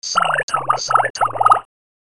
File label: bubble; saitama